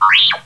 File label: r2 squeal